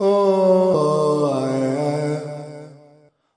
echo oh ay yai yai, MALE SINGING

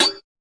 fx drum

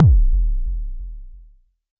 Jomox MBase, drum machine; Jomox MBase 01

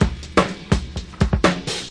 125 crunchy off break, drum loops and breaks

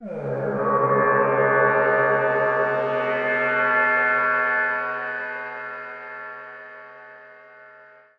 resonant sh101 tone, Synth

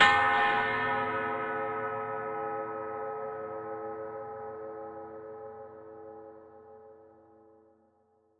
drumsplosion clang, electronic hits

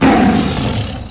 lion roar; Zoo and Nature

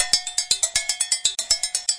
busy hi bells, 120 bpm

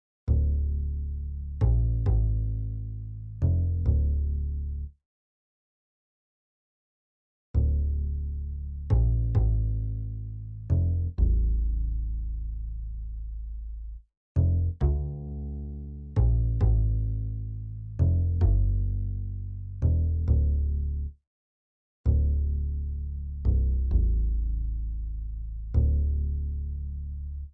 bass loop, blues

